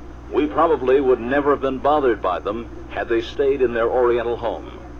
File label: Oriental Home